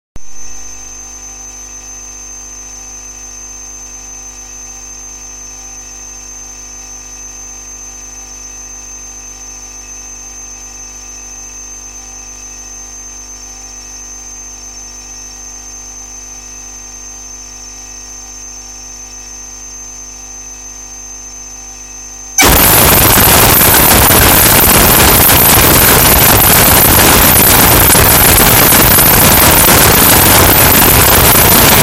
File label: Morning Noise Broadcast